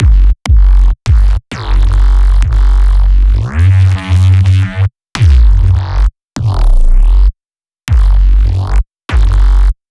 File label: Bass Experiment